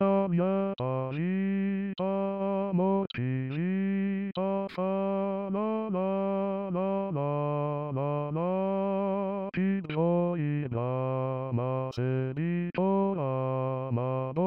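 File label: L'innamorato bass; Gastoldi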